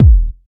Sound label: bass drum single shot E key